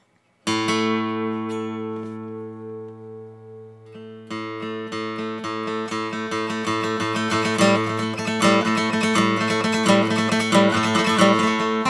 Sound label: Guild D46; acoustic guitar; 1985 Guild D46 acoustic guitar